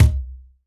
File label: WORLD DRUMS MIXED DRUMS